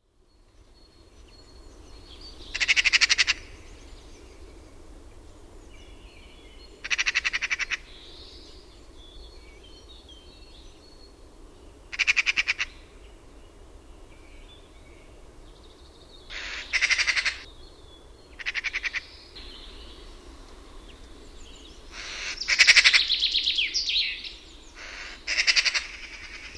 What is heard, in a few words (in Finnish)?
HARAKKA; LINTUAANITYKSET